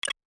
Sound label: Videogame sounds